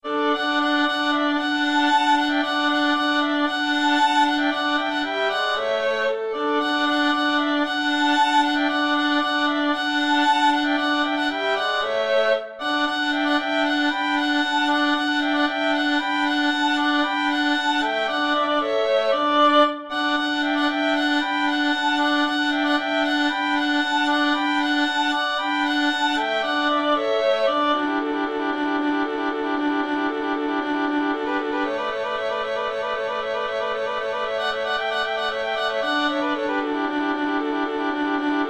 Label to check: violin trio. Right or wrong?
Right